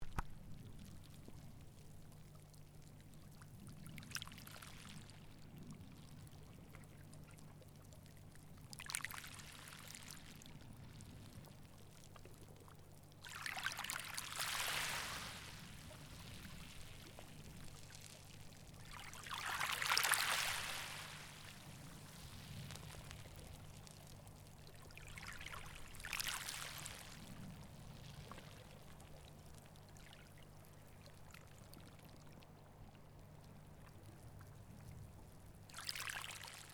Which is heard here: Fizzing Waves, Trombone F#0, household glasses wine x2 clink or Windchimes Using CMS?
Fizzing Waves